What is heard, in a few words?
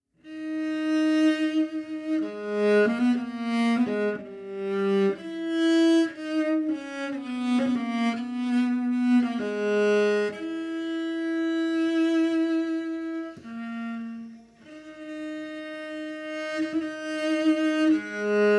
Contrabass; Assassin's Creed Syndicate